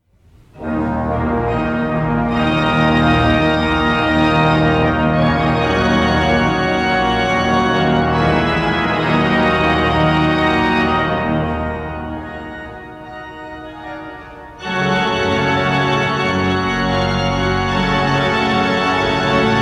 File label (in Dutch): Improvisatie